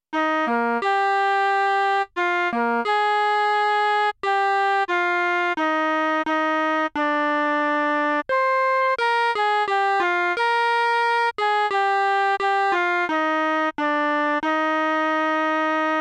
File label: Full Band, arranged for band Beginning Band (and strings)